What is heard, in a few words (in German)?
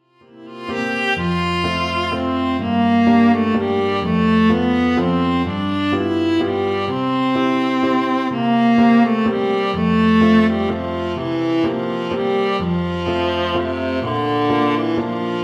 Walzer